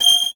Multimedia Internet CD-Rom Flash Beep Mechanical

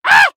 Cartoon Human Male Scream Monkey Scream Short; AdobeCC

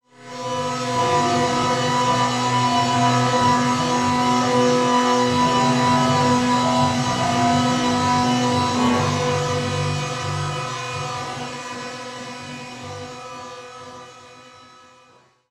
Title Transition Feedback Pulse; Production Element

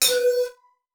Multimedia Internet CD-Rom Flash Buttons Short

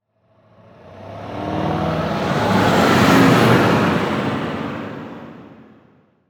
Transportation Truck Diesel Truck Drive By Fast

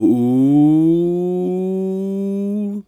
Human Male Ooh Your In Trouble Ooh

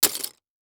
Impact Plastic Debris Hit By Wood Stick; Impacts

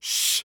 Human Male Shushing

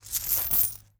Impact Coins Dropping Into Pants Pocket

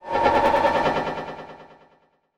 Multimedia Internet CD-Rom Flash Wipe

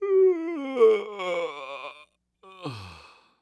Human Male Yawn Tired Yawn

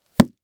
Household Book Close Book

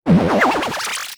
Multimedia Internet CD-Rom Flash Pop Up

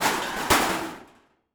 Crashes Metal Crash

